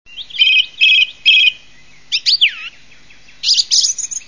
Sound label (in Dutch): zanglijster